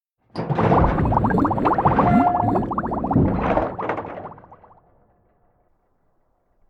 sound Boss Shark Breaks; freedom fall

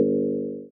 Panel Reactor Hand; Among Us

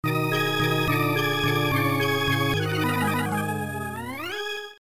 fall over tune

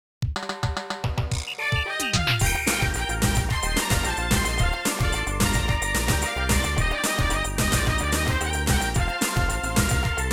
Ending - Happy; Aero Fighters 2; Sonic Wings 2; arcade

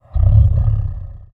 MONSTER Growl Deep Medium mono

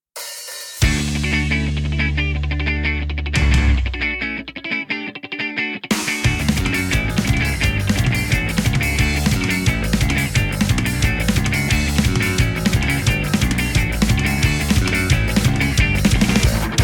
Radio Music; let it die

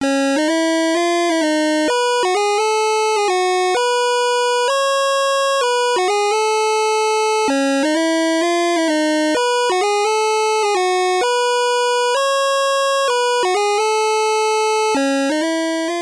Putting Initials Music; Sindbad Mystery; G80 Raster; arcade

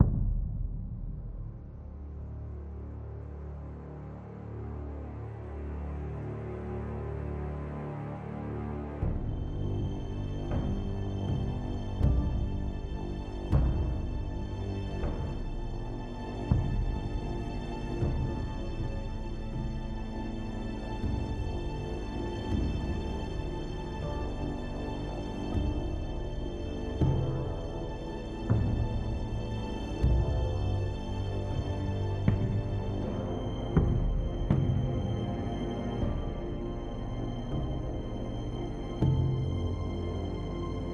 Loop Uneasy

